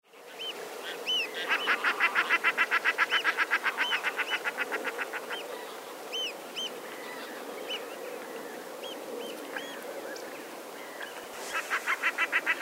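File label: peganka golos, common shelduck voice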